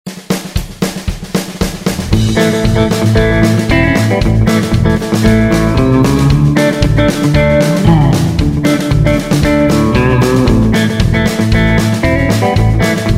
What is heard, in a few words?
Road Movie Rhythm, guitar